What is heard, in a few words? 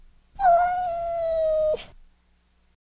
cat meow